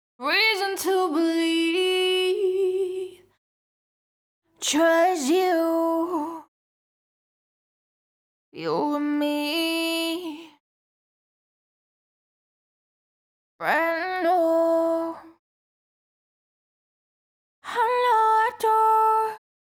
CLEAN AD LIBS (108 BPM Eminor), Pop Vocals Acapellas; Just me and you; Ghosthack; Ultimate Pop Vocals